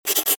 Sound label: Key Tone